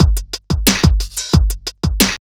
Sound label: Speed loop cut time funk, Drums Beats